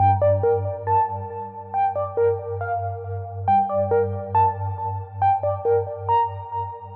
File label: Softpluck WET 138BPM, Gminor; Melody & Synth